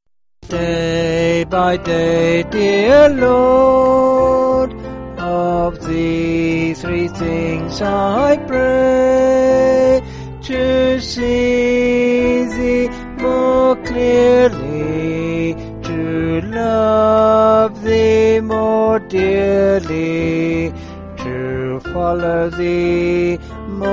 Vocals

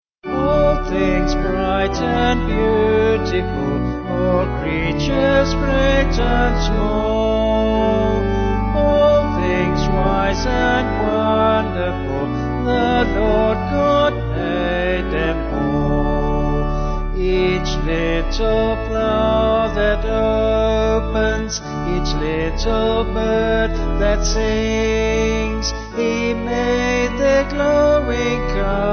Vocals Organ; Monk

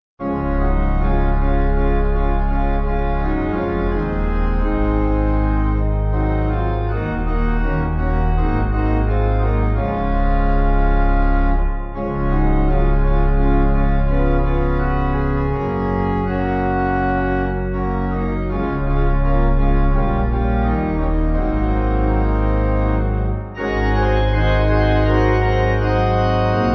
Pipe

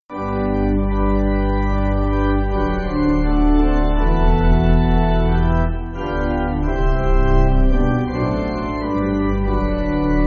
Pipe, church music

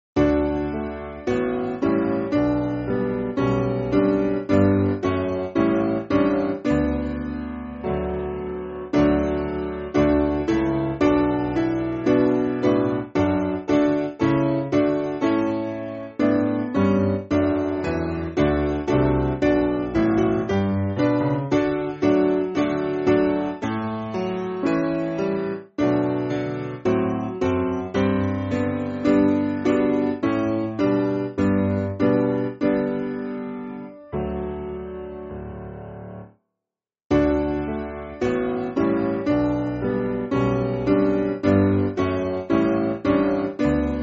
Peel Castle, Piano; Here O My Lord

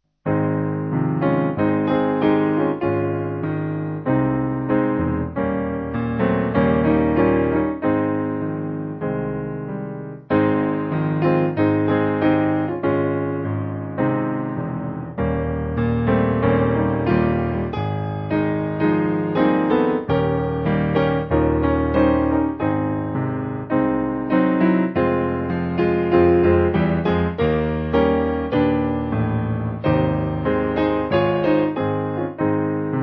Piano

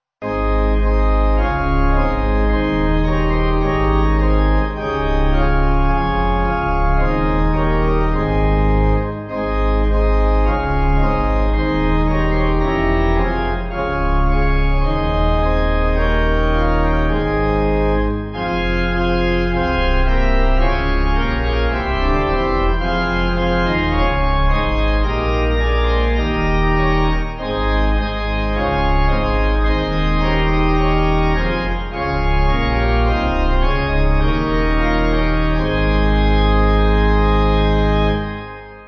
Pipe

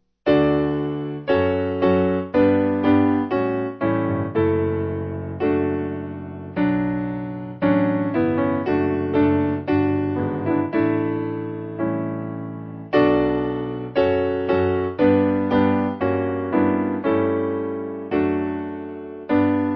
Piano

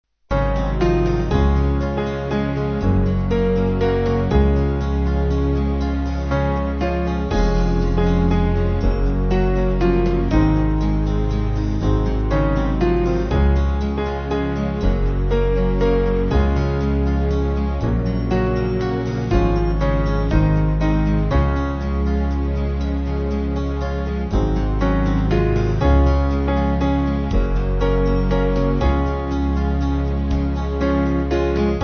Band; Small Band; Father God In Heaven

